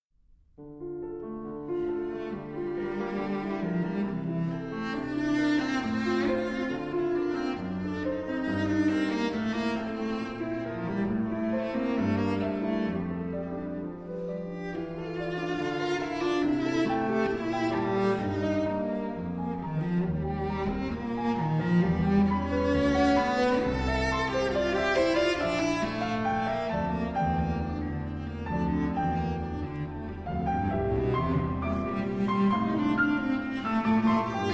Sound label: Sonata For Cello and Piano Allegro non troppo